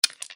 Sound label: click tile